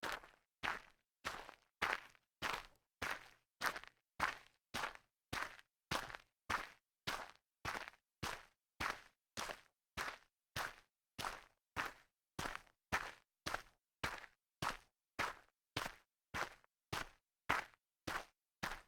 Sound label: sneaker walk light grit, foley